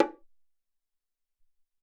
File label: Bong Hi O, Alesis SR-18